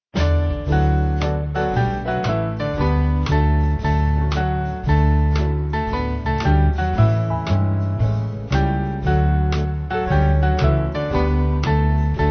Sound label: Band, Music Backing Files for Churches; I Know Not Why; McGranahan